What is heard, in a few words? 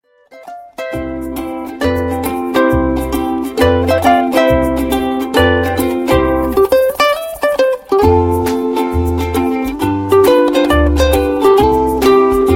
music